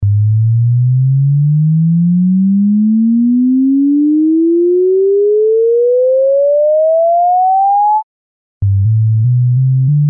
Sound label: Sweep via autotune